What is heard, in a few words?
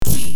skill hit